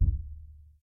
Thump distant; Zomboid 41.78.16; Project Zomboid

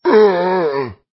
zombie hit; Zomboid 41.78.16; Project Zomboid

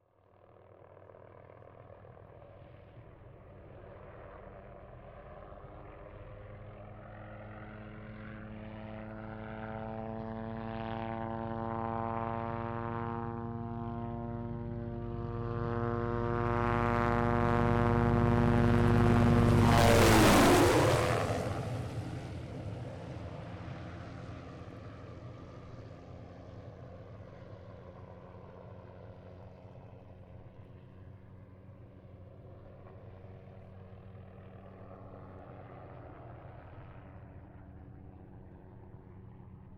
chopper; Zomboid 41.78.16; Project Zomboid

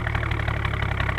jeep idle

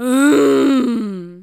zombie rand; Project Zomboid

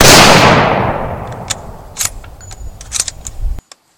hunter Shot; Zomboid 41.78.16; Project Zomboid

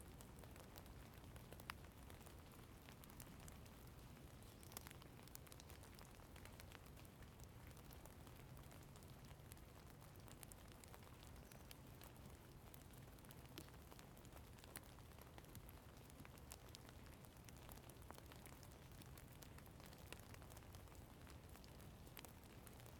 ambient Outside Light Rain Woods; Zomboid 41.78.16; Project Zomboid

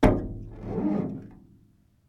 thump squeak; Zomboid 41.78.16; Project Zomboid

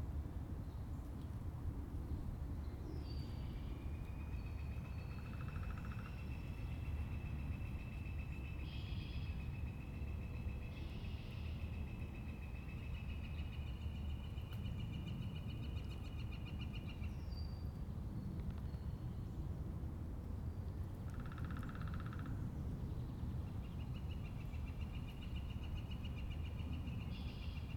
ambient Outside Day Woods; Zomboid 41.78.16; Project Zomboid

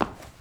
foot tile; Zomboid 41.78.16; Project Zomboid

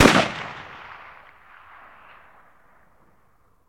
assault rifle distant; Zomboid 41.78.16; Project Zomboid

